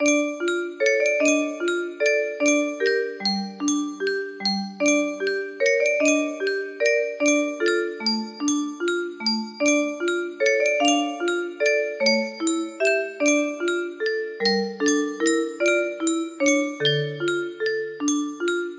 waltz, music box melody

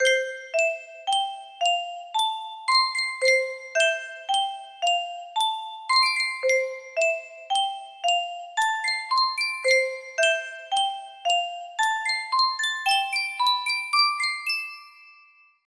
sankyo custom tune music box, music box melody

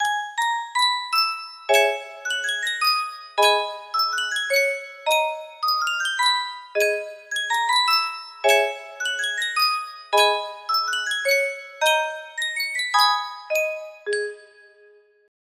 Sankyo music box, melody

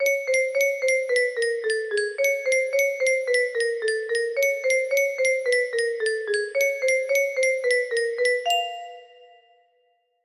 melody

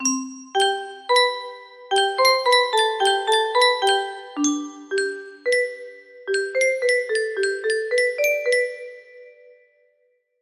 music box

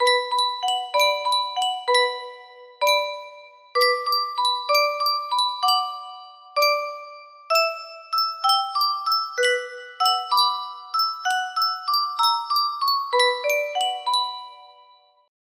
Yunsheng, music box melody

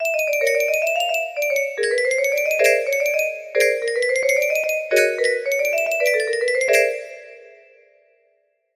melody; circus brain freeze

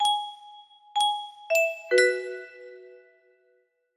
melody

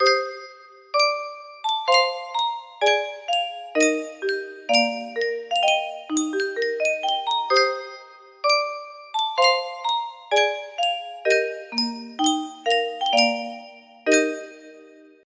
Yunsheng music box

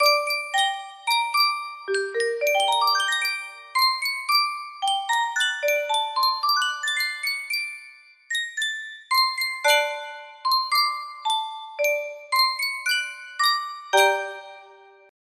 Sankyo music box, blues

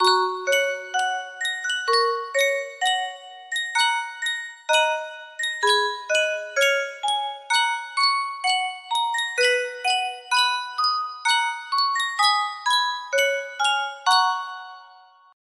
sankyo custom tune music box

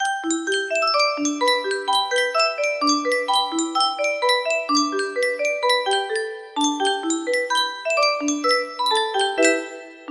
yunsheng music box unknown tune

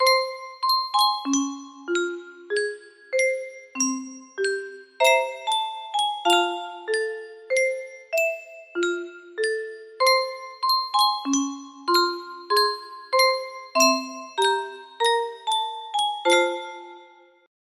Yunsheng music box, music box melody

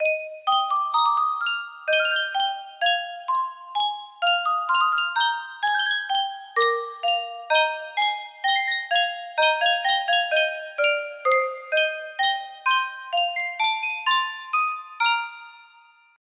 Yunsheng music box; bach invention no 5

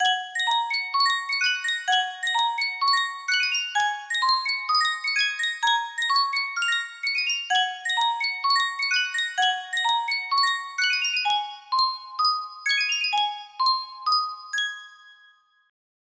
yunsheng music box

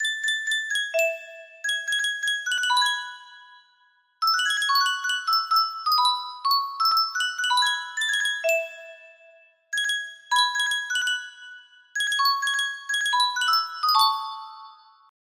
sankyo custom tune music box, music box melody

